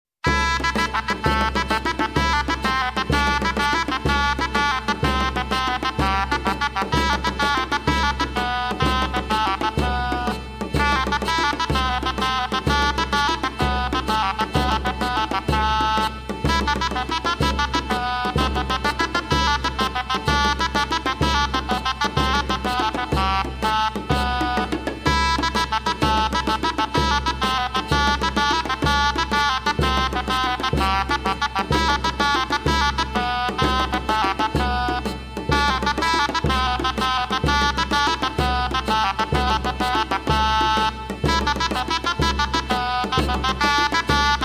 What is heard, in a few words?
Saltarello